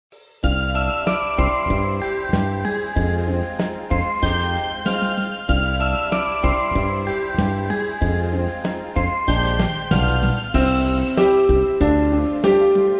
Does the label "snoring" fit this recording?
No